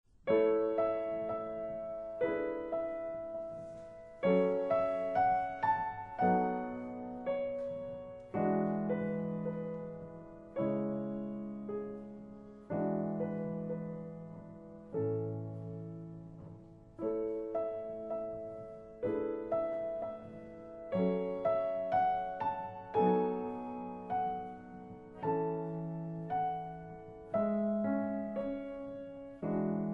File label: Classical sample